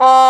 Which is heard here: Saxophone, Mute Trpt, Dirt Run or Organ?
Mute Trpt